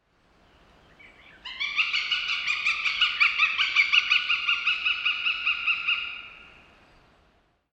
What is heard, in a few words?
northern goshawk sound